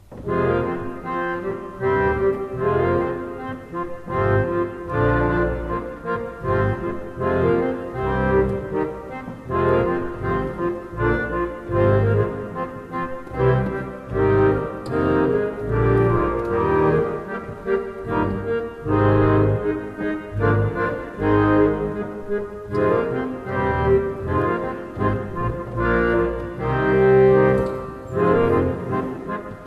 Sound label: Mannborg, pipeorgans